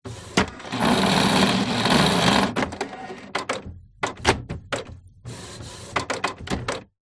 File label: technology computer printer start up grind initialize